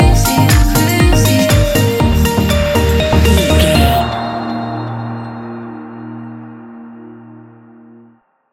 A fast paced piece of club music; A fast paced piece of club music perfect for the nightclub, and a youth orientated visual scene.; stinger

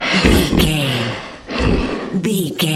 troll monster laugh

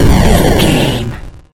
retro explosion deep

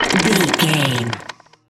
gun auto barrel spin slow stop long

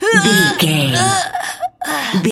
voice female death

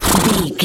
horse breath

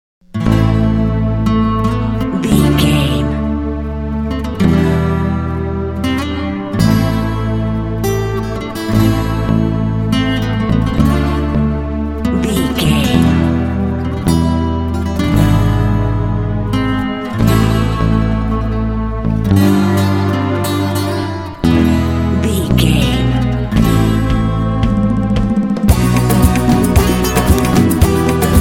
latin passion

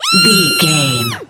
voice female scream

